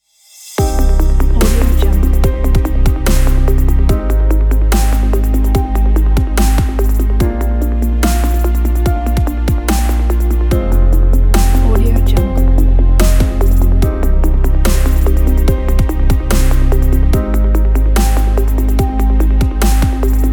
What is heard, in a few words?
cinematic dubstep